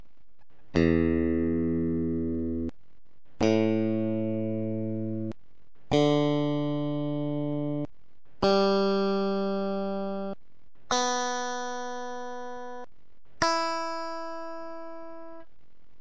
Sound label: Guitar tuner